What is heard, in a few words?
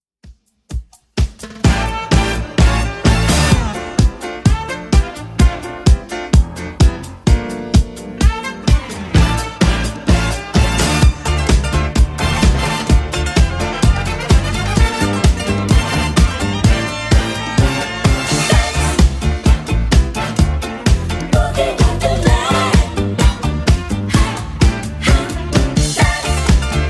80s Redrum 128bpm